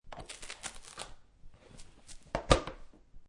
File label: Letter Organizer Open Close, Ring binder being opened and closed; Crunches; Objects; Lynne Music